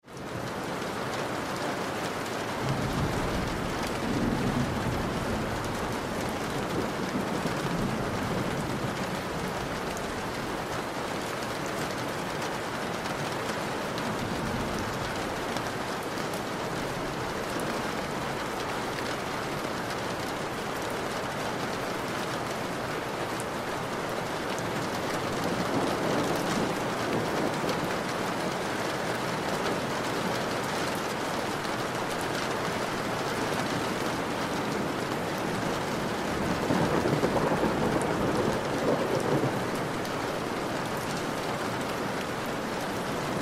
Rain on window sill with distant thunder

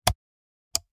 Computer mouse long click

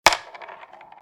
Chess capture piece knock; leisure

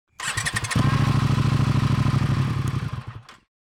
Motorcycle turn on ignition; vehicle

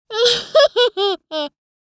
Little girl giggling

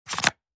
Credit card swipe purchase transaction

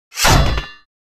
Battle axe shield impact

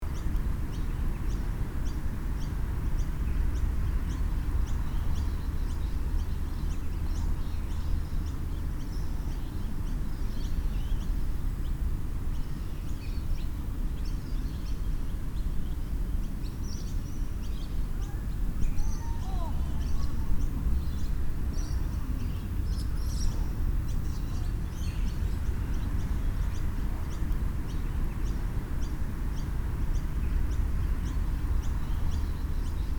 Quiet park playground distant kids playing; gfxsounds

